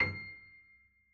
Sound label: piano c; Golden Crayon Sounds